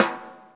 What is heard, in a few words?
snare drum sample f sharp key